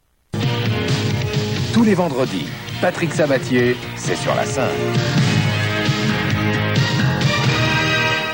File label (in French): jingle